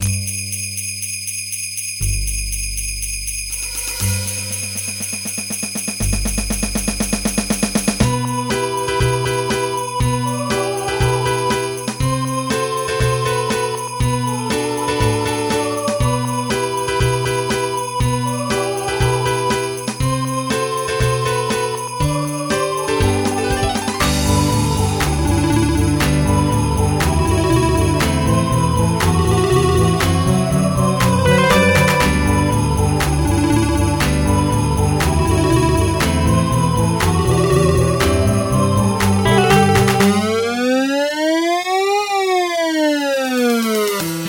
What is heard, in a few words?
Jingle Bells remix, MIDI